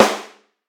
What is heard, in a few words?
low acoustic snare sound c key